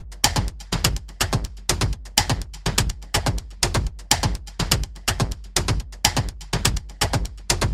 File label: detroit techno loop